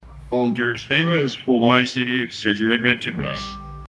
quiz show right answer, cheering

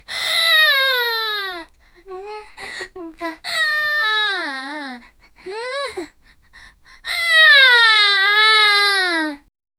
russian teenage girl yawn; female

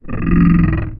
deep creaking wood monste

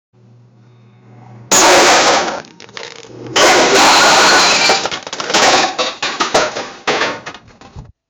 sound when a box is, destroy

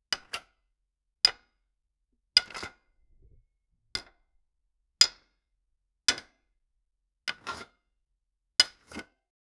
metal rod placed on wood

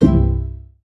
puzzle level fail descend

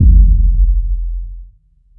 deep resonant bass drum

